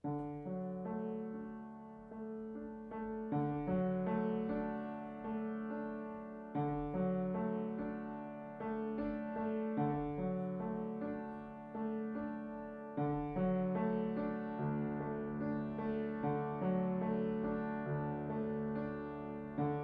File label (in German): Hintergrundmusik